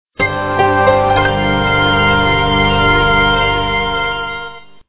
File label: Splash, ROM Music; WebTV